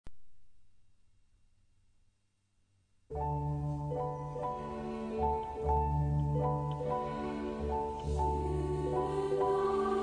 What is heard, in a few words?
Barcarolle uit Hoffmann Erzahlungen